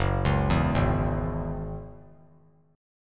F sharp 7th Suspended 2nd; F#7sus2 arpeggiated

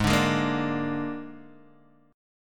A sharp 7th G sharp

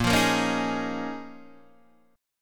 Bb Minor 11th Bb down Guitar DADGAD

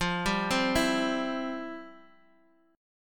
F Major 7th Suspended 2nd, F, down, guitar

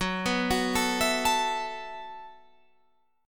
E Suspended 2nd Suspended 4th F sharp

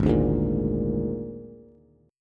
Ab Suspended 2nd Flat 5th Ab Bass; strummed; JGuitar

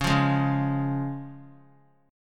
C sharp Major; strummed; JGuitar

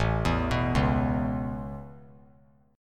A Major Add 9th A, chord; Aadd9 arpeggiated; JGuitar

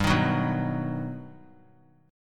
G Augmented Major 7th, chord; G+M7 strummed; JGuitar

